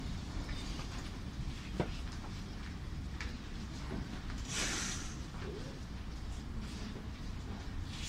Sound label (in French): ambiance, Metro